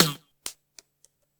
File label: boing; Heaven Studio Plus; built To Scale DS